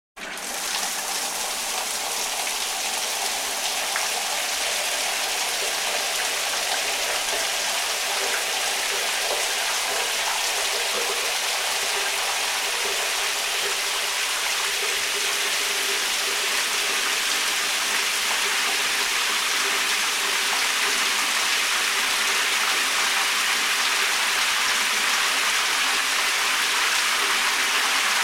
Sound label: Shower Running Fast Running That Turns Into Slow Runn; Household